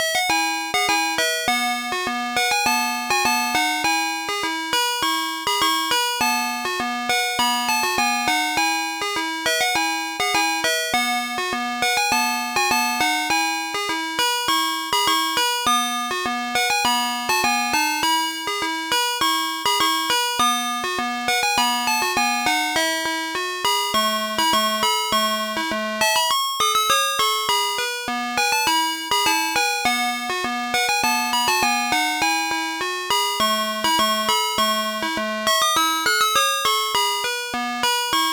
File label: toy keyboard melody